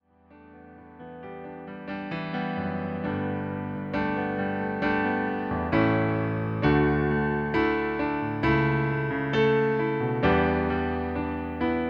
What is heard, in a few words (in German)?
Pianoplayback D-Dur